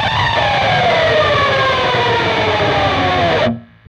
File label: PRS SCRAP, Guitars; PRS SCRAPES